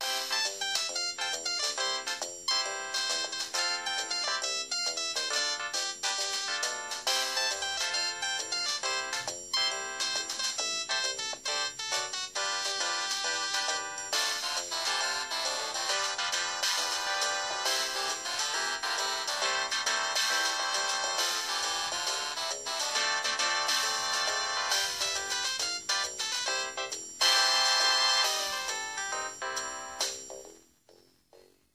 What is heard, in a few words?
gavotte ringtone, samsung sgh t100